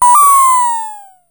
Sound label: ODD RM BELL; Zero-G Ambient 1; ANABELL PERC